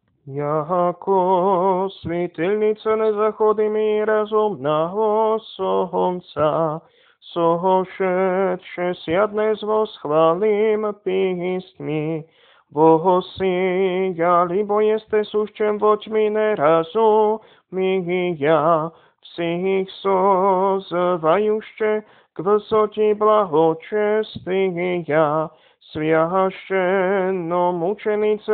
Kondák svjaščennomučeníkom, hlas 3; nedelna-skola

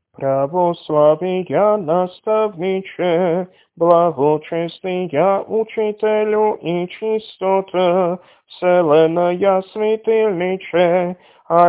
Tropár svjaščenno prepodobnoispovídnikovi hlas 8; nedelna-skola

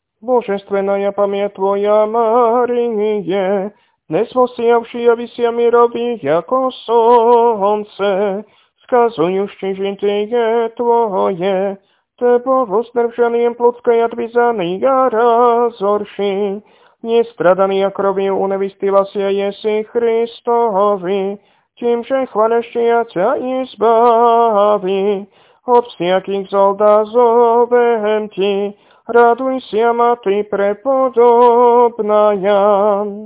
Kondák prepodobnomučeníčke, hlas 4; nedelna-skola

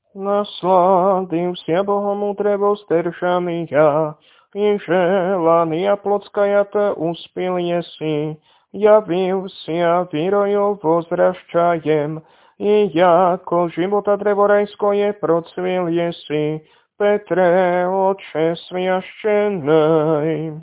Kondák svjaščenno prepodobnoispovídnikovi hlas 2; nedelna-skola

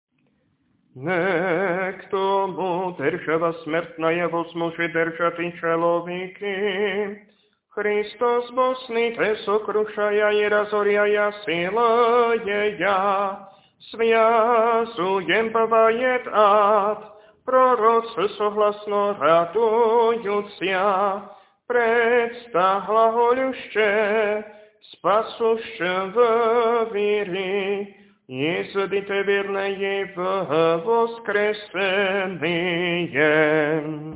Kondák voskresný hlas; nedelna-skola

